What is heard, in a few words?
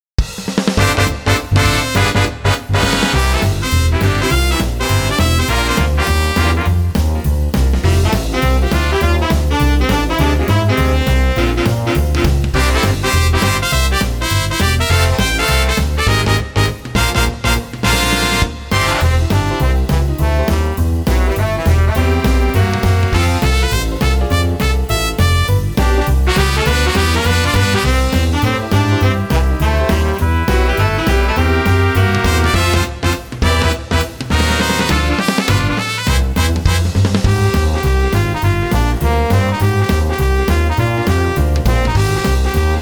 Big Band; Dynablade; SGFR presents Super Star Symphony 2021